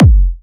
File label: Kick, Techno, One-Shots, Drums; Ghosthack; Techno Sounds 2025